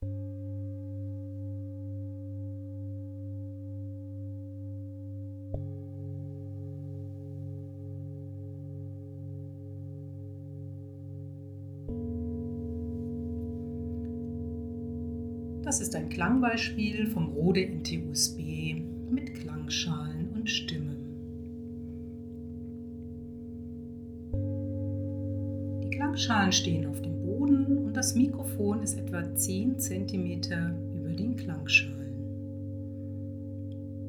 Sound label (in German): klangschalen und stimme mit rauschverminderung rode nt usb